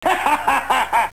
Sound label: HA HA